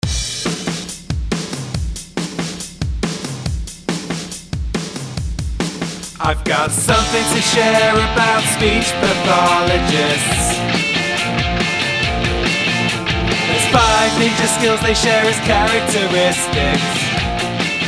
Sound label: Song